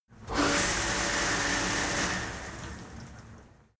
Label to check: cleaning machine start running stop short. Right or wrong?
Right